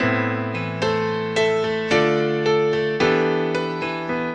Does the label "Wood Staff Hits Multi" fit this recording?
No